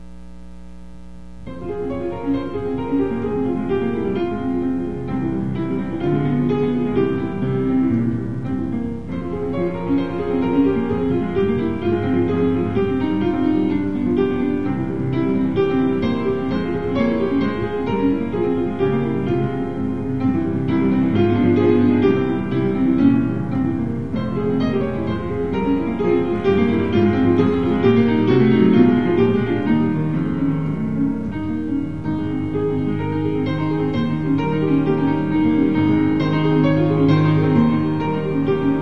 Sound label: impromptu f minor; Henselt